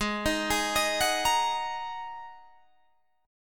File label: G sharp 7th Suspended 2nd G sharp Guitar DADGAD